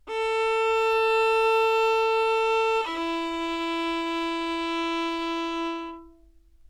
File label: Two Note, violin